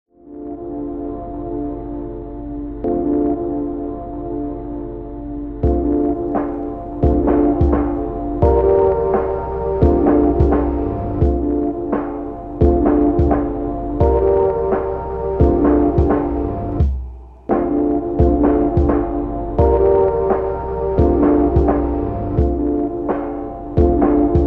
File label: Beat